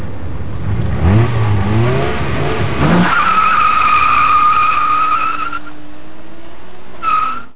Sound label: tire spin